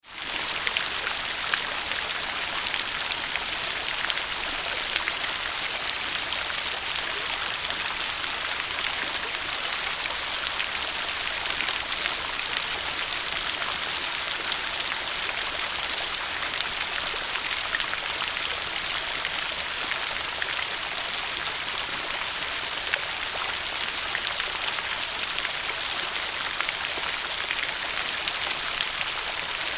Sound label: Fountain Large Rain on Water; Regents Park Fountain; Weather